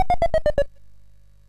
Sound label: MOS6581R2, GAMES; Sammy Lightfoot